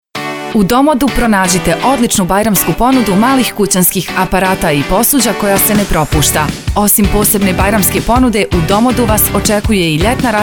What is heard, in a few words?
energetic